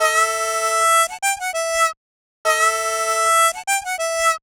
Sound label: Harm 098bpm A, Harmonica